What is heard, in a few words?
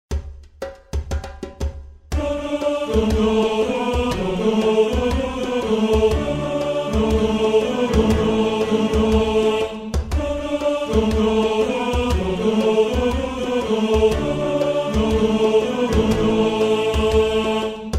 Tenor; Sananina; Tenor 2